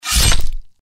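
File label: deathclaw claw attack; npc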